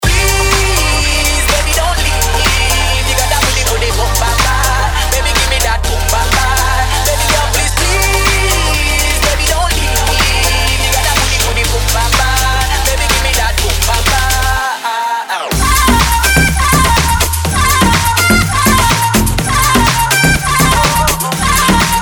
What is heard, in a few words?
Sax Remix